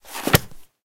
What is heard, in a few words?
popup close